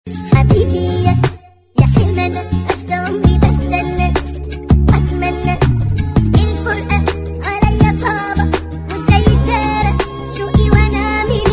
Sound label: arabic song